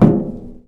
metal box footstep; physics

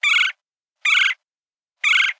bird, ambient animal

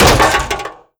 vent step, headcrab reviver, creatures

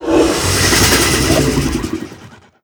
idle out, barnacle; Half Life Alyx

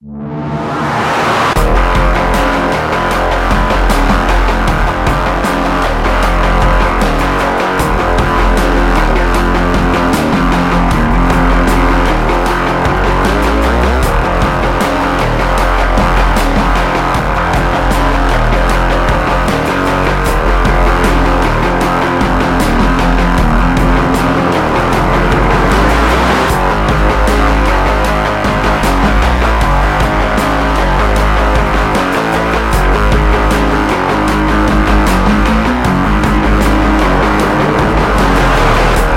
garage combine, music

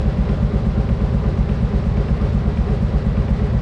industrial loop, tones; Counter Strike Model Pack; CS:S

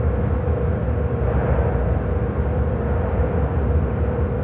engine room, ambient atmosphere

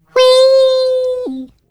turret launched; Portal 2

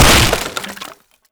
break wood plank; physics; breaking

